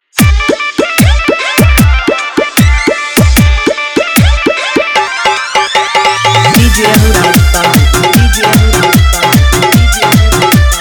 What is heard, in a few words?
Full Dhollki Bass Rod Dance Mix